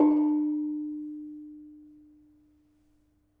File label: Bonang Barung soft, gamelan; gravityharps; timbrebox